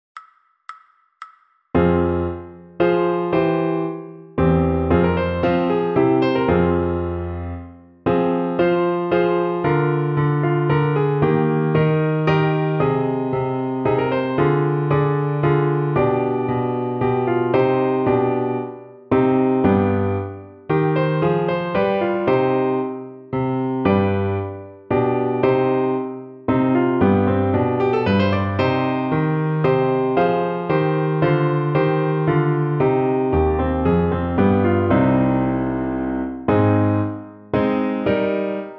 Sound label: piano; Telemann